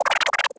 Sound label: hit chirp, droids robots